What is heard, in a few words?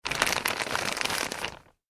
plastic bag thick rustle; Thick Plastic Bag Movement / Rustle; Materials; McKinney Sound